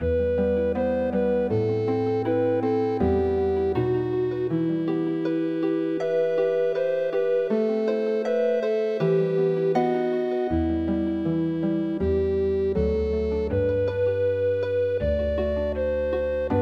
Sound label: Healing Harp